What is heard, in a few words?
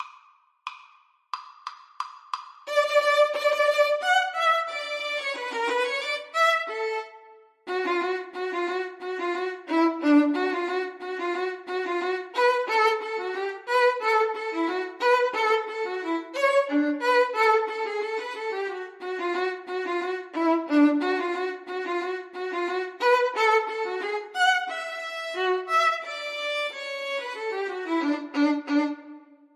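radetsky march violins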